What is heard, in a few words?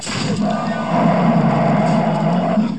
bear sounds